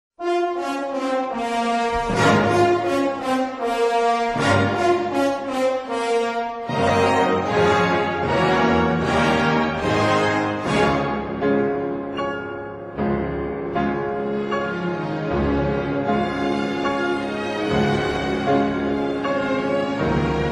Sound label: Piano Concerto 1 B Flat Minor; Tchaikovsky